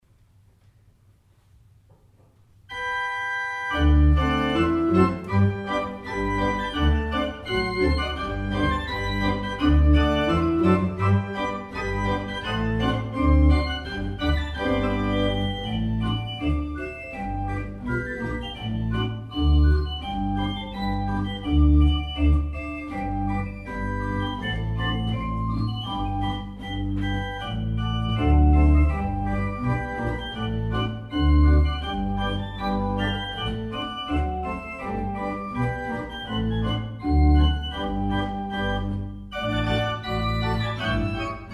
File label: schottisch